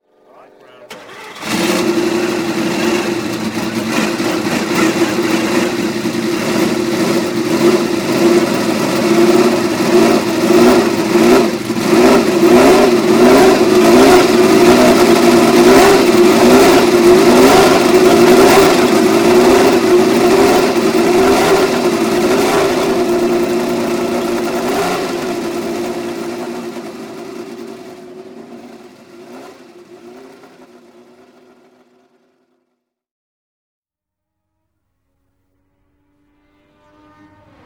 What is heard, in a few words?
Ferrari 312T3 flat 12; Motorlyd